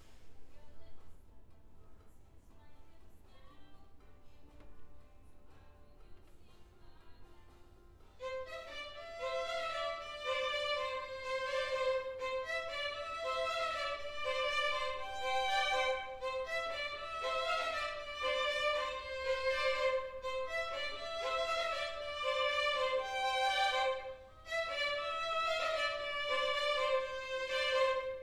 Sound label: Cello